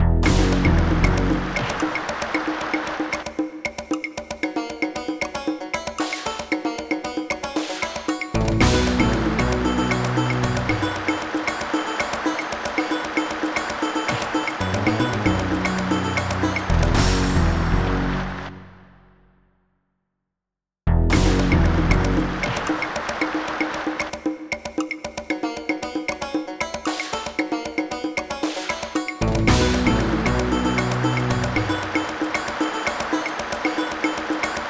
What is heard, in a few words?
shoot; shootout